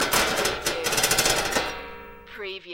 Metal Drag Scrap Clanking; rattling

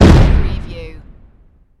Explosion Grenade; action impacts explosions; lynne-publishing

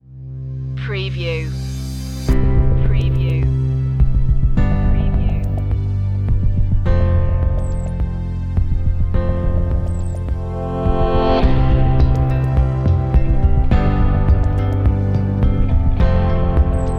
best motivational song

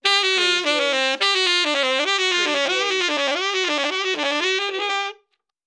Sax Riff

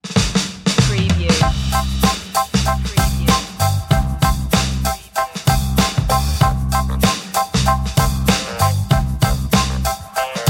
spicy beat, Funky / Groovy

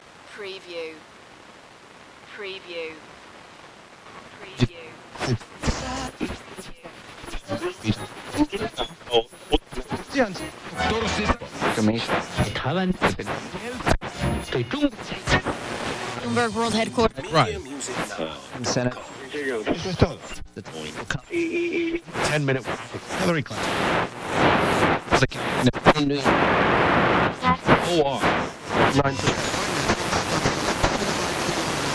Brick Radio AM; Sony

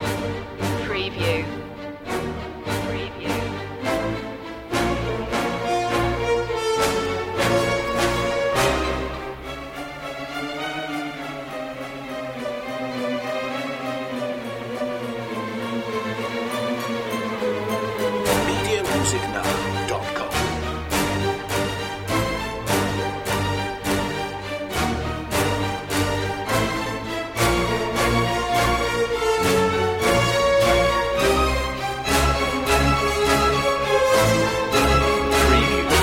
fight for survival no choir no perc; strings only

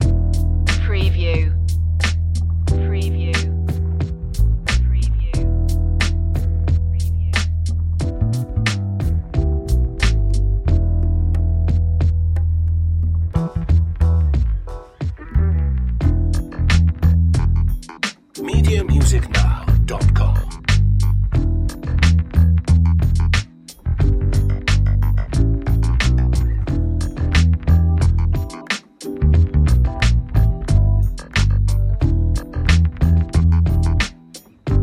groovy baby, Funky / Groovy Underscore; 60s

